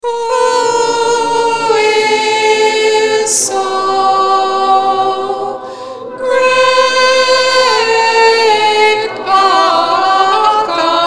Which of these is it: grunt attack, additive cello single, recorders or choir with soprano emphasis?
choir with soprano emphasis